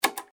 Click Big; slingo-adventure

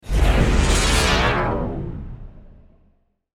Board Power Down; slingo-adventure

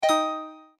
Special Coin Appears; slingo-adventure

